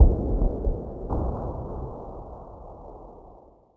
cannonade; World of Tanks Blitz

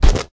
connect padding

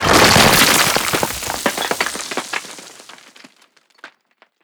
debris detpack cement; Explosions

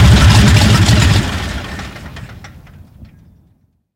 morava stop; War Thunder; engines

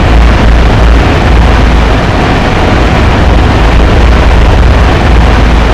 Vehicle Engines; Red Orchestra Ostfront 41-45

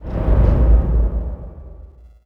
land stereo

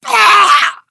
pain

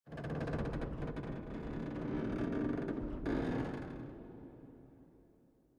ship engines; World Of Warships

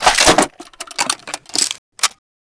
reload clip in, foley; m60; ModernWarfare

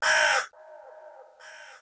winter crow; World of Tanks Blitz; ambient medvedkovo

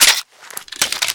beretta9mm reload clipout

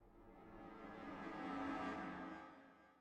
music interactive; World of Tanks

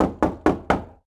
door knock friendly; Rust

